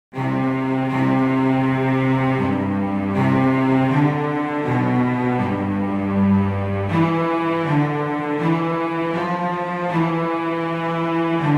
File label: O Come All Ye Faithful Adeste Fideles, Christmas Beginners